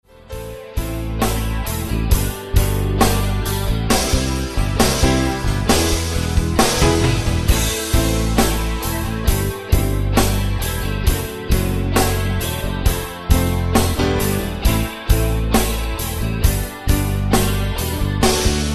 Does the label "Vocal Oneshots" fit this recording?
No